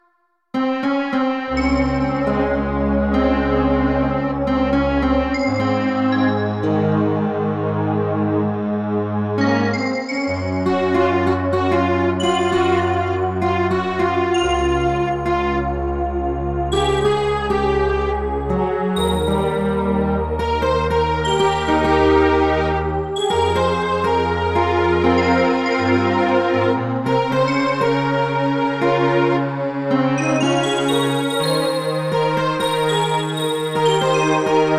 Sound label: Keyboard improv